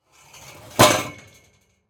Glider Slide, Playground, Equipment, Glider, Slide